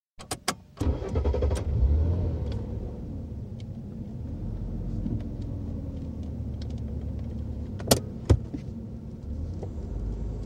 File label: Jaguar Sovereign, interior, switch on, constant run; 3.6 litre, 1986/7 model; Car Sound Effects; BBC